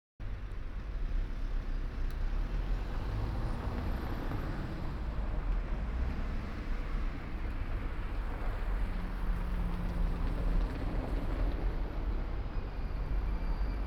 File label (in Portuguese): rua de alfandega, Brasilien; Fieldrecordings